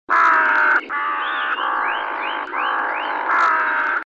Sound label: rook call; WRC 2019